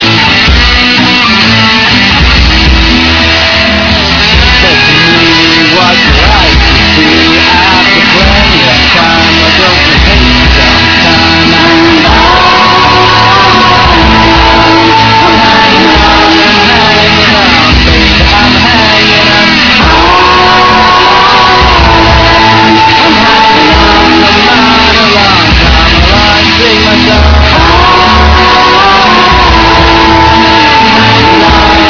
blues

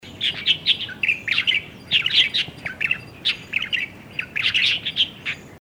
Light vented Bulbul; Pycnonotus sinensis; call; Bird Call Recordings

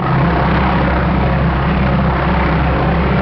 Prop, flightsim

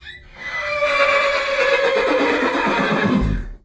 Loud squeak whinny

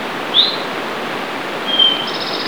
towhee

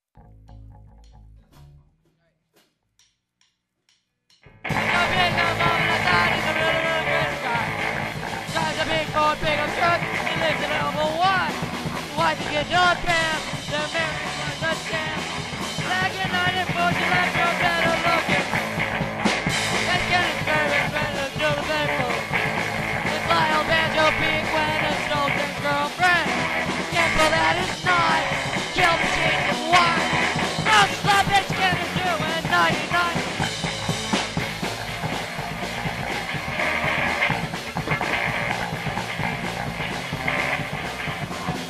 banjo

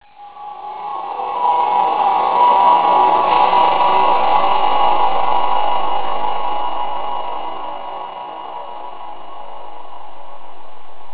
drone; cold

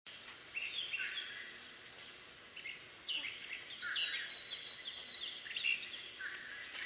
crow fish, Common Crow and Fish Crow; Sayerville, River Drive North, New Jersey; with Prairie Warbler

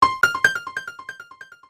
piano

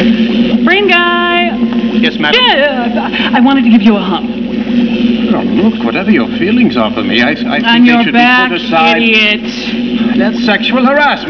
hump; Pearl wants to give Observer a hump

